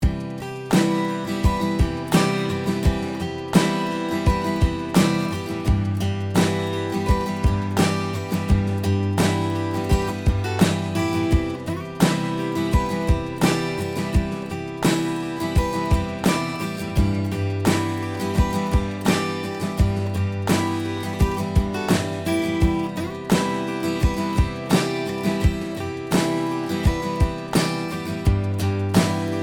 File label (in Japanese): VINTAGE COMP GTR ストローク; UA VOLT